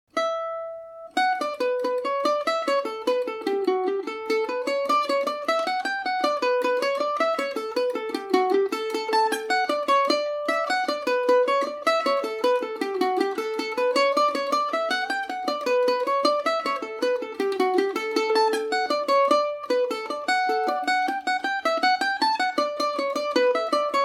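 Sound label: tar road to sligo d major, mandolin; The Tar Road To Sligo; Paris Swing “Macaferri” mandolin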